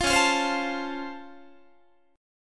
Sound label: C sharp Augmented Major 7th C sharp down Guitar, chord; strummed; JGuitar